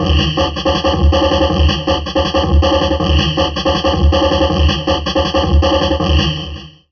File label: Drum Loops Crazy Processed